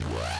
droid servo

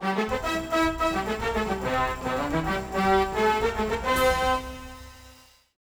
music race win; Ty The Tasmanian Tiger 2 Bush Rescue; XBOX

